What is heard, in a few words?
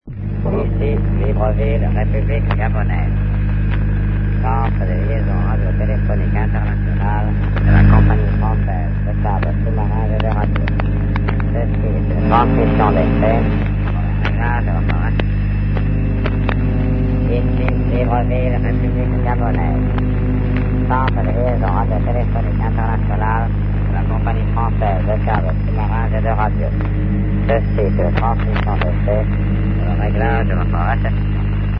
usb